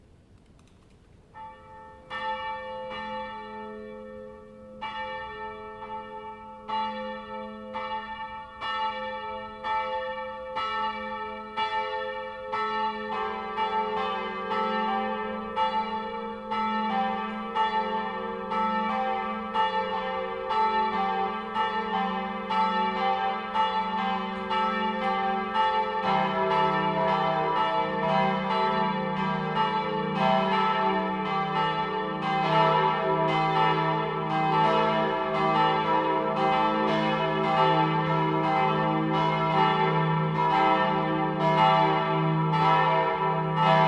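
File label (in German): Glockenplenum, Rottweil Münster Heilig Kreuz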